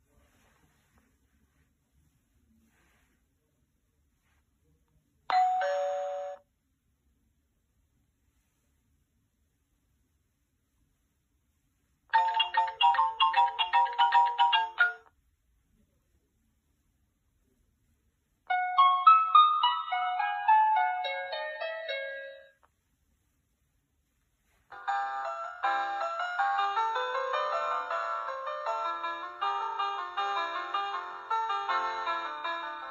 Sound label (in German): 6 Melodien der Video Tuersprechanlage; Balter Evo